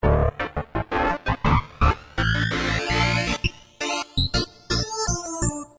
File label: sine plus